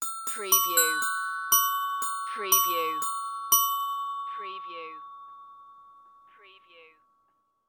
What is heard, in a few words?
Music Glockenspiel